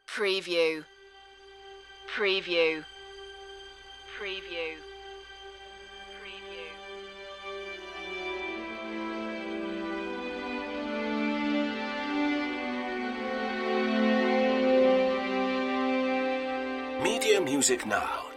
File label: dream of strings